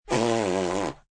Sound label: Fart Raspberry, Mouth effects, fart, a la raspberry (human voice); Sound Effects:Human; VoiceBox